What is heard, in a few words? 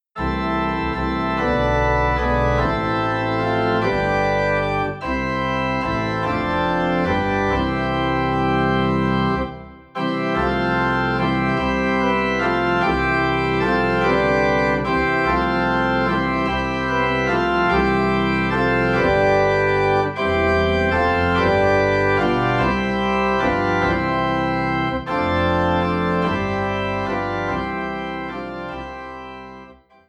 Organ Low in C; Good Christian Friends Rejoice; christmas